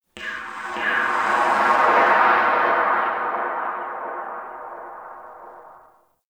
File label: Wind Pipe; Ambience; Dante Unbound; Warframe; Entrati; Dead End Secret